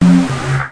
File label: noise